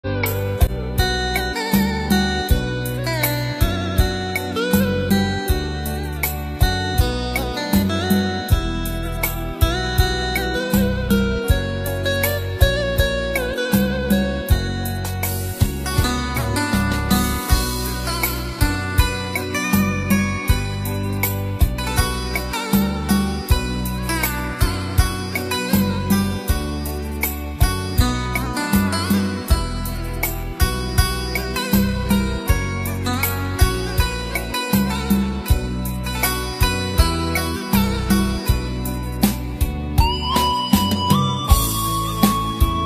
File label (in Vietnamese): Nhạc Không Lời Hòa Tấu Nhạc Guitar Hải Ngoại